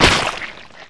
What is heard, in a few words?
hit slime splat